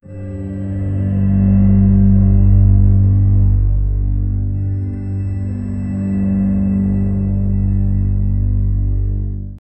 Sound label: subtle orchestral drone w; brooding